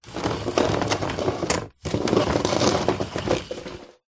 household vacuum cleaner stick dyson off roll head on hard floor